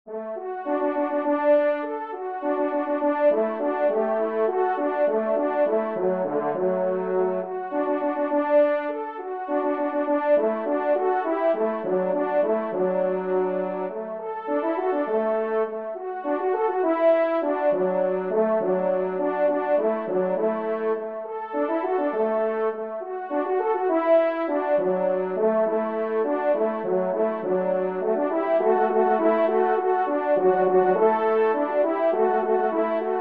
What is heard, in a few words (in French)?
Valse Do Maj TRP